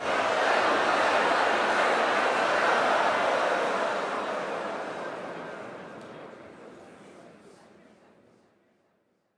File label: Crowd Excitement Trail Off; Lunaro